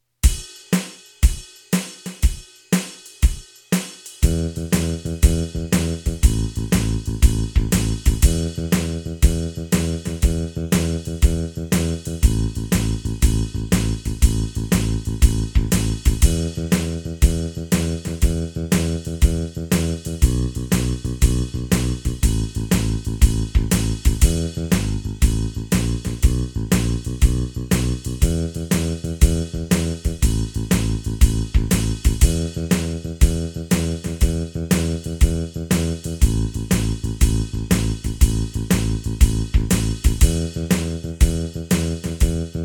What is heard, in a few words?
Blues 120